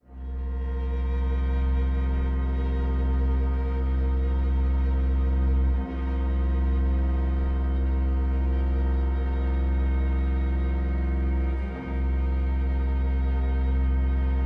Solo Strings & Solo Fender Rhodes

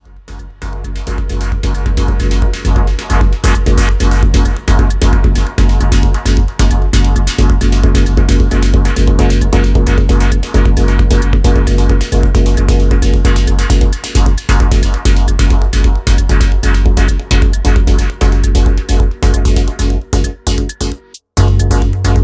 Filter Bubbles

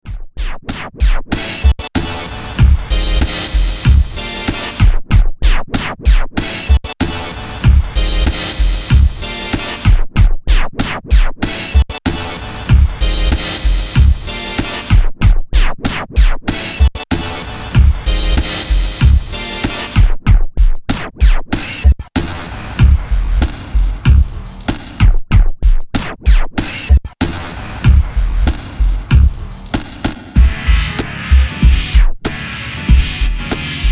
synth

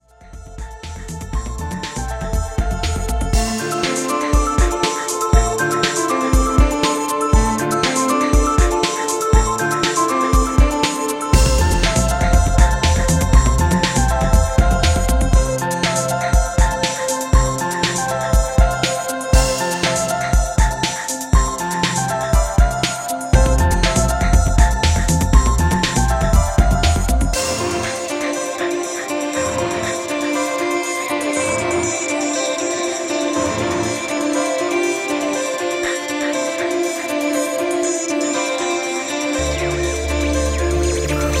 Piano 808; electronica; Strangely tripping electronica

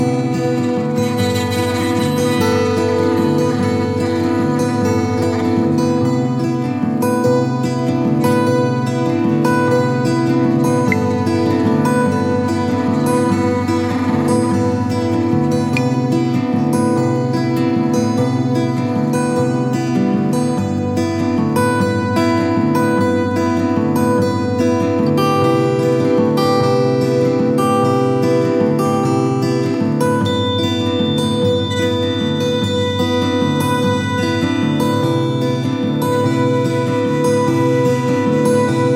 Ebows, Guitar, Percussion, Guitar

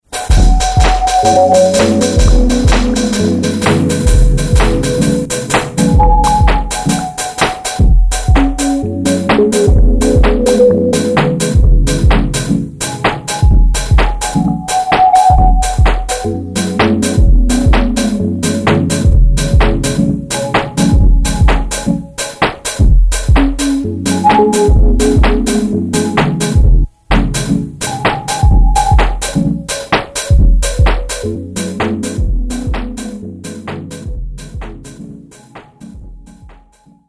KIX CLAPS CHORDS N HATS

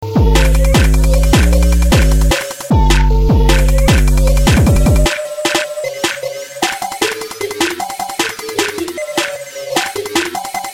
instrumental

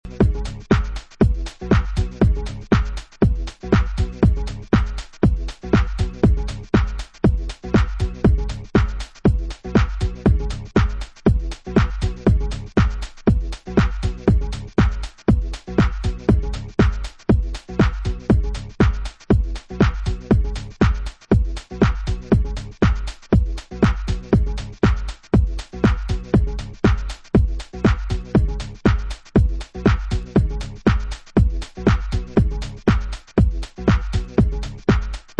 (tronic bass dub)

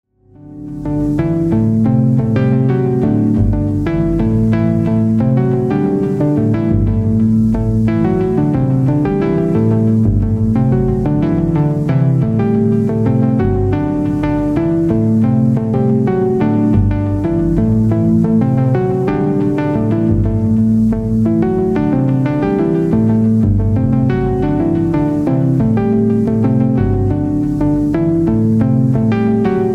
doepfARP

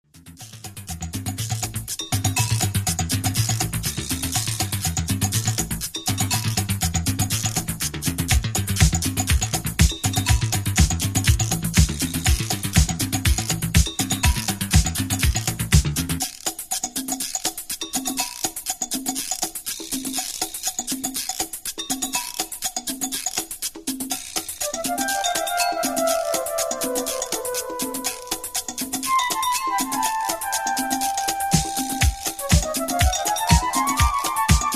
dub instrumental; Disco

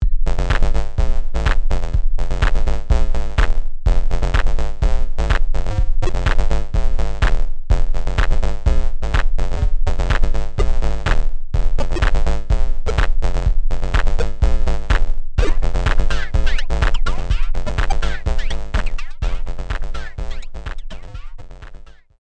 synthesized downtone; Ambit3

